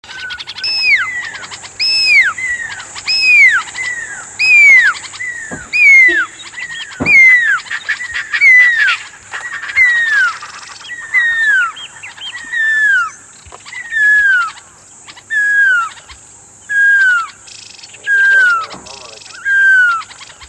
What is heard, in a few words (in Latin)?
glaucidium perlatum